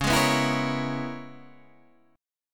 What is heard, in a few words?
Db Minor 13th, chord; Dbm13 strummed; JGuitar